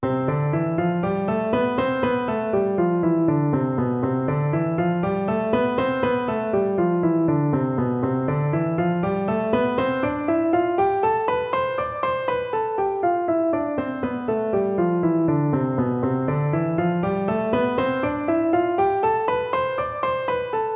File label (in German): Tonleitern Spielen C Dur